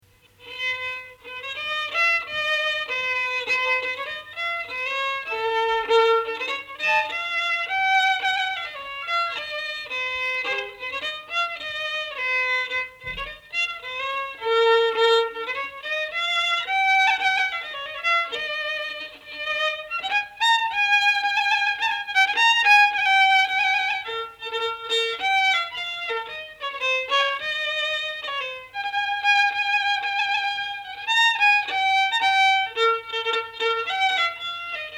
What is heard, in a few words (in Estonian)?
Polka Ruhnu viiul folk; Elias Schonberg